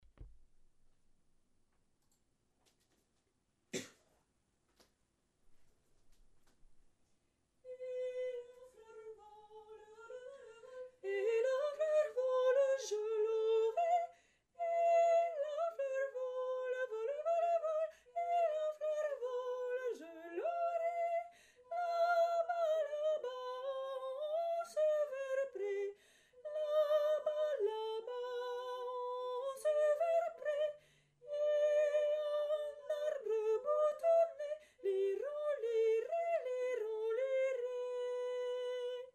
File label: tenor; Ateliers chant; Et la fleur vole